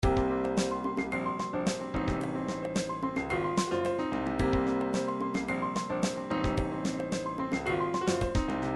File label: triphop; Newtronic Triphop Construction Kit